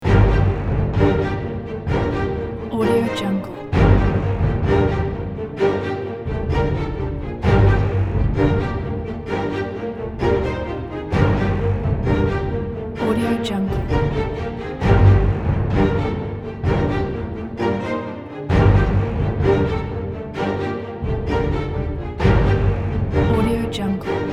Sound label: final boss battle video game loop